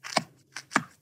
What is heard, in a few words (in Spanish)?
koala pasos; mekanta; jungla